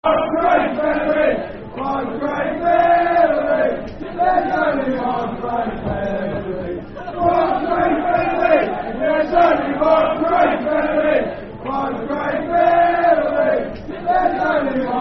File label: Football Chant (Soccer Songs); One Craig Bellamy; Fulham Ffc